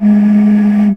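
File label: Japanese bamboo flute; Voice Crystal - Steve Reid Definitive Percussion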